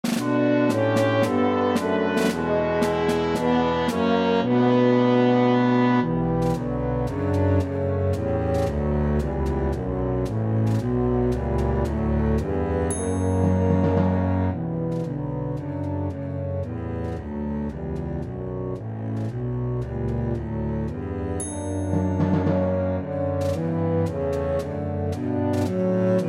Track with Melody; God Rest Ye

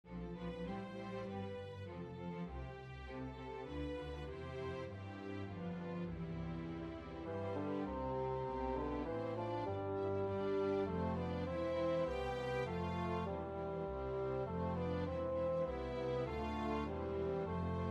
Tenoroon Track with Melody; The First Nowell

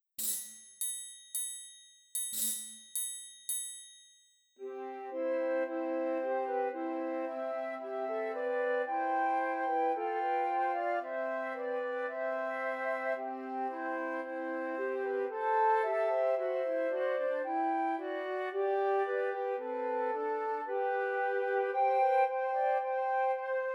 Trios for Three Descant Recorders

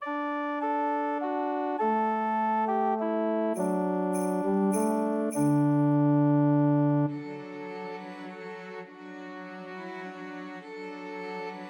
Christmas Trios for Two Violins and Cello; Silent Night

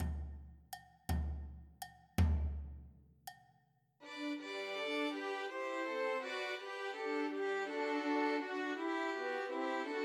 Trios for Three Violins; Sussex Carol; Christmas

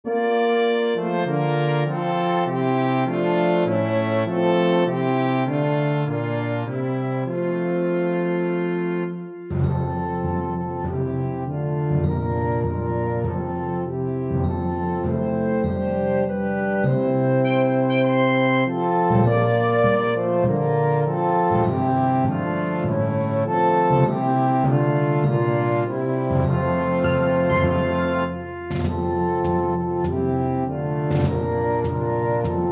Flute Track with Melody; While Shepherds Watched

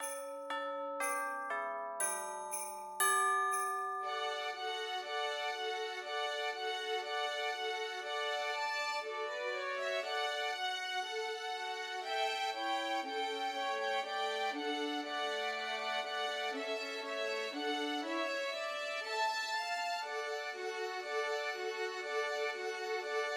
Christmas Trios for Three Violins; Jingle Bells

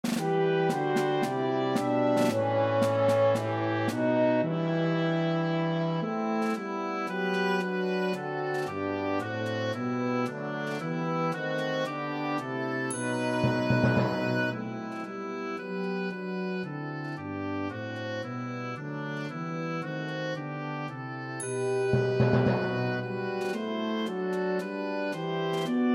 Oboe Track with Melody; God Rest Ye

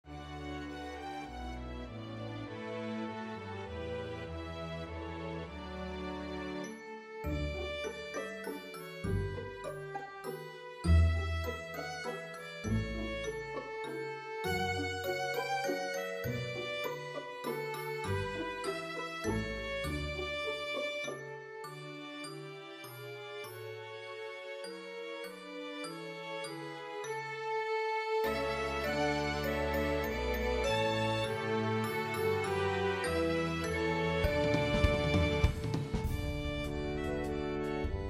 Violin Track with Melody; We Wish You A Merry Christmas

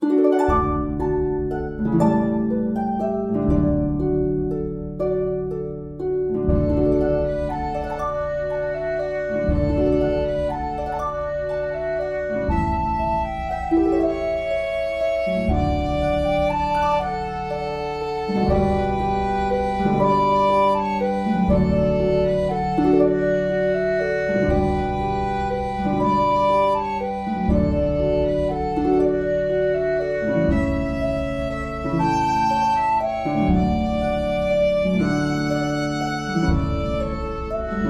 Violin track with Melody; Silent Night

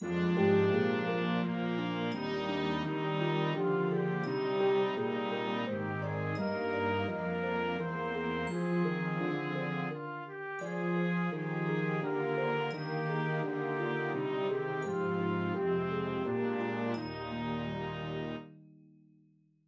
Trumpet; Away in a Manger

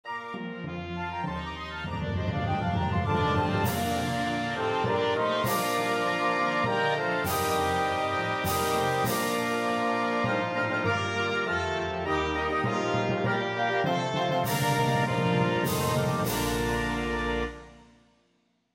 Trumpet; The First Nowell

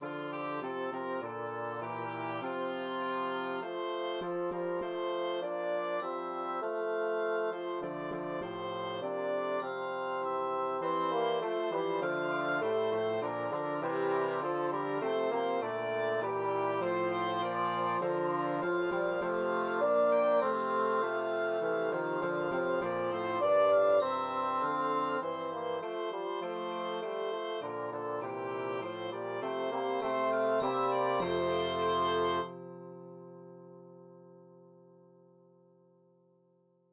Tenoroon Track with Melody; The Holly and the Ivy

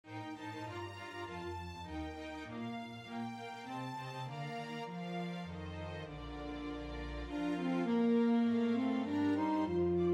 Saxophone Track with Melody; The First Nowell

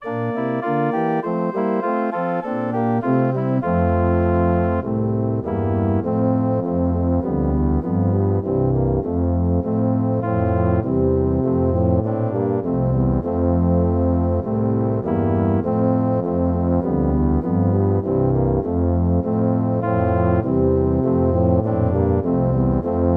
Tuba Track with Melody

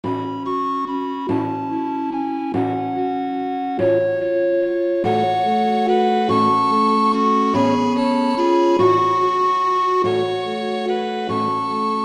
Violin Track with Melody; We3Kings

